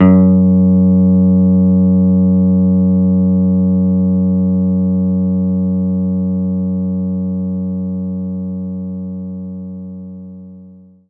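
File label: Jazz Guitar F sharp 2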